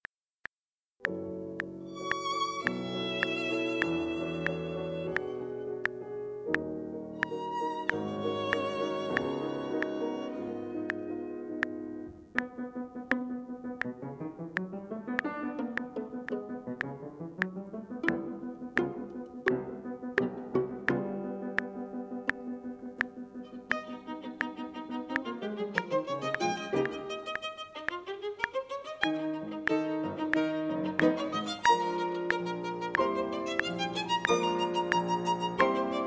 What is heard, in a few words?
sonification PLP BPM restricted; tempogram; tempogram toolbox